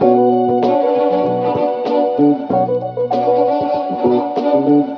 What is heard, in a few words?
keys, Phonky loops